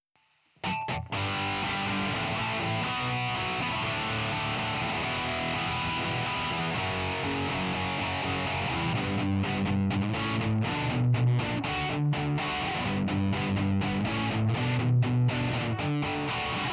guitar amp; reason essentials

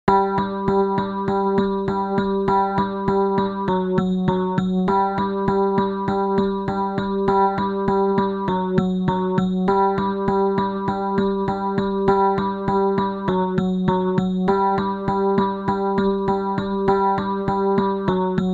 Moog multimode filter XL test e-piano; universal audio

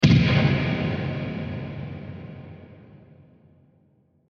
gun warm cathedral

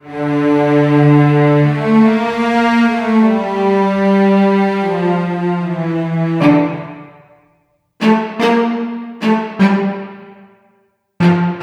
midi guitar instruments; eastwest midi guitar instruments test

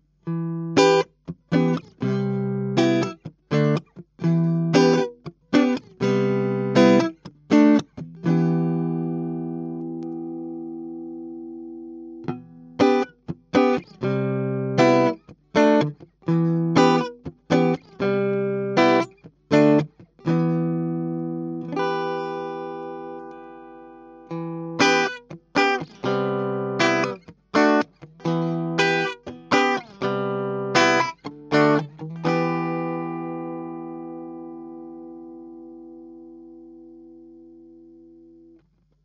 cln; rocktile; Gitarreneinsteiger

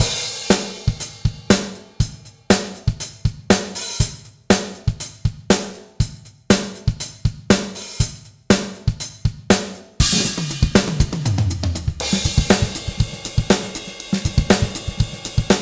drums in drum room; fabfilter pro-r

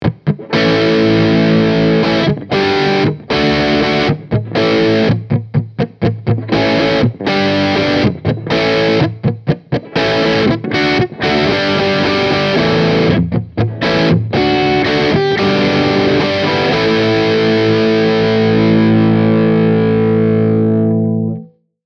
riff bridge, epiphone sg g-400 pro

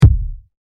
Kick; OpenRoad

